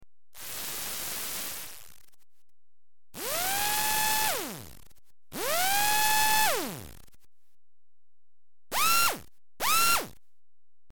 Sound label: motors